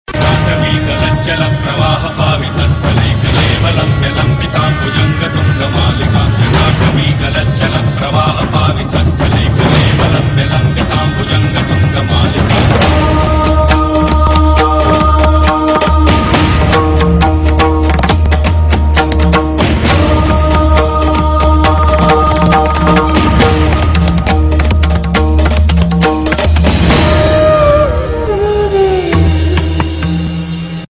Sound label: Shiv Tandav Tune; Zee TV; Sanskaar Laxmi; TV Serial Tone